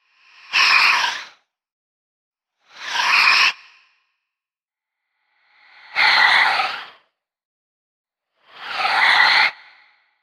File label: Ghost Gasp Pair